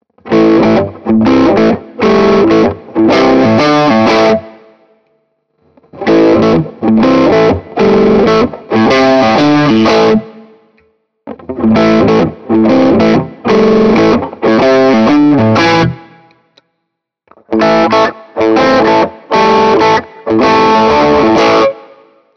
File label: Ibanez AR520HFM LBB mid-gain all positions riff